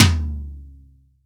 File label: MIX3 LTOM, STUDIO TOM